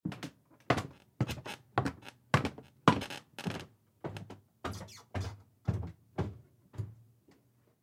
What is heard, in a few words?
Footsteps Walk Down Stairs Underneath Staircase Perspective; Foley